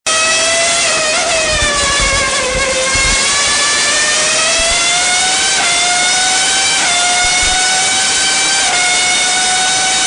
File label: Racing Sound